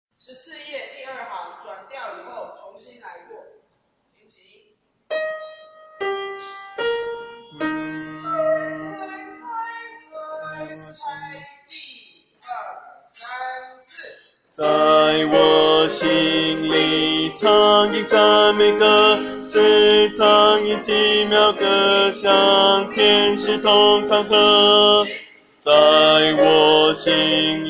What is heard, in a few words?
bass